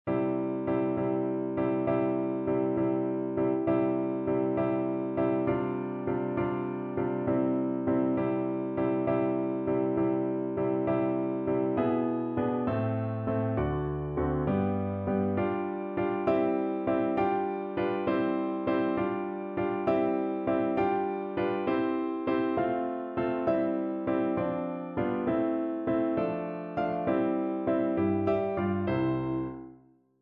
Sixths High Voice accompaniment; Grade 5; Lesson 3; MTB Singing Vaccai

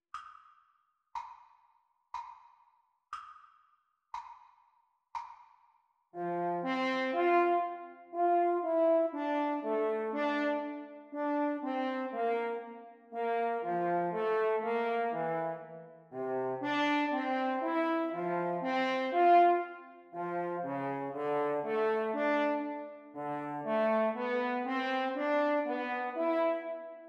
French Horn Duets Playalong Practice

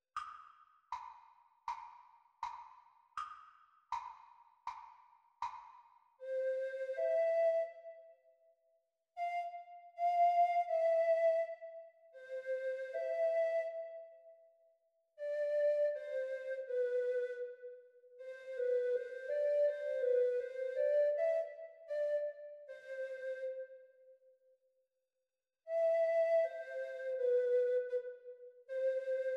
Treble Recorder; Grade 1; Practise speed

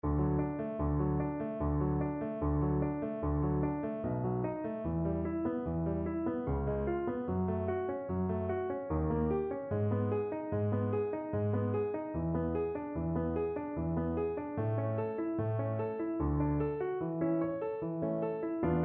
low voice semitones accompaniment; Vaccai

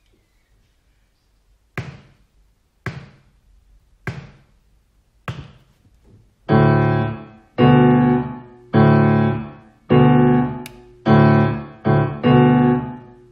piano duet blues practice speed; Grade 4

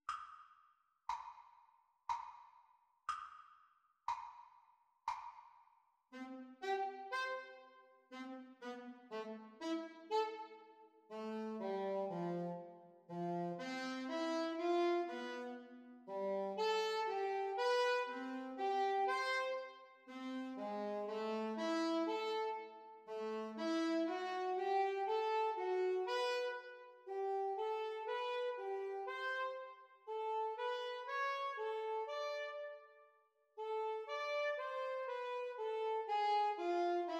Sax Duets Playalong; Practice Speed

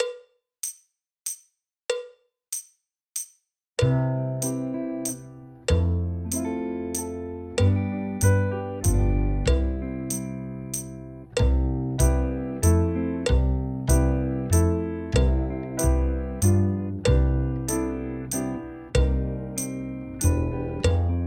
Drum Kit Playalong, Drums Grade 2 Backing Tracks; Grade Two; MTB Exams; MTB Drums Grade Two Handbook

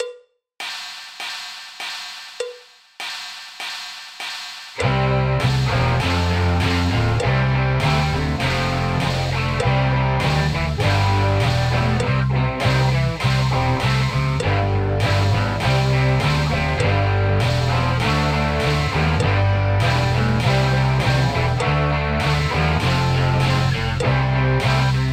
Drum Kit playalong; MTB Exams; Grade Three

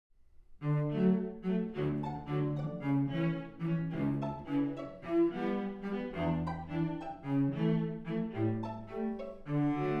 One step at a Time with cello

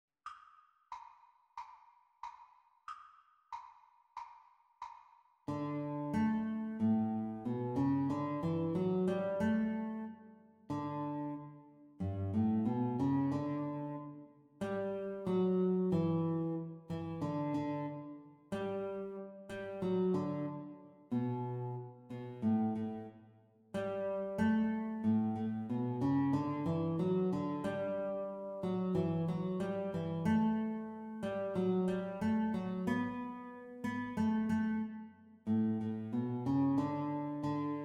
Guitar Duet Playalong Exam Speed

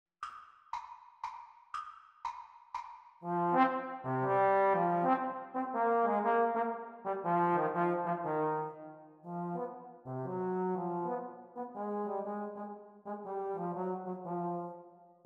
Playalong for Eb Horn Exam Speed

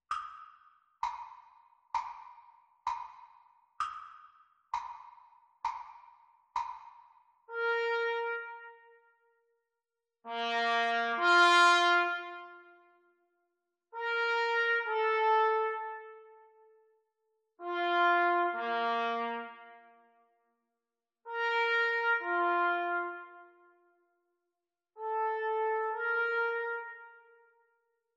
Trumpet Duets Practice Speed; Grade 1

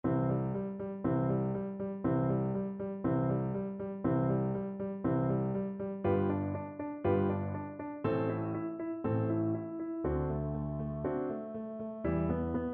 Skips of 7ths High Voice Accompaniment; Lesson IV; MTB Singing Vaccai

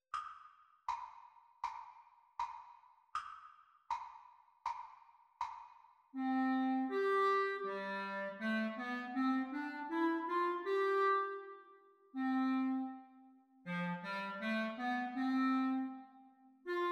Practice speed Recording Clarinet Duets

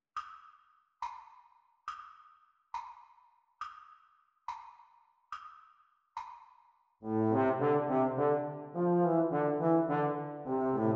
Playalong for Baritone Euphonium Practice Speed

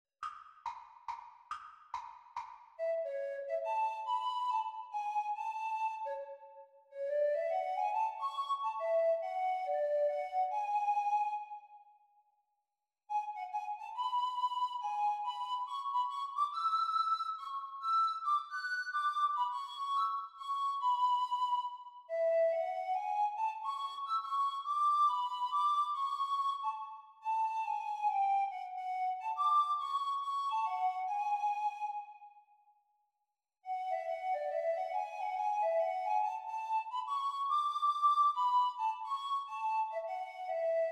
Descant Recorder Performance speed

